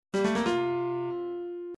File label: get missile; Game System Gameboy; Metroid Ii; Firemario Syo Axenn